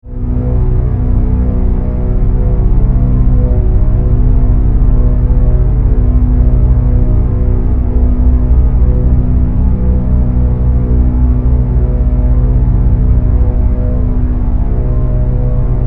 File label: Synth Strings, Horror; 5.1